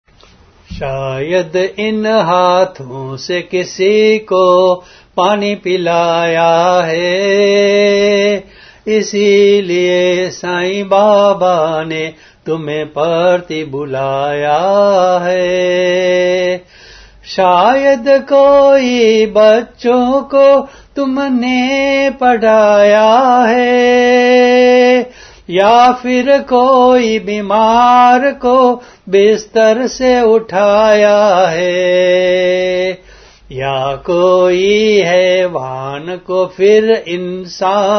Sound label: Bhajan